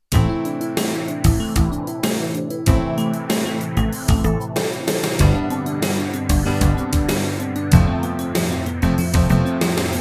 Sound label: Roland SC88 MIDI; SHARP X68000